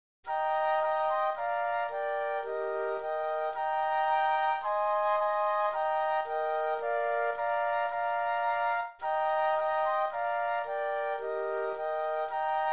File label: Flute Trio; christmas